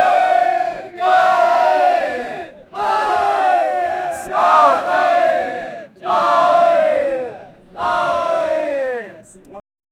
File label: a large group of people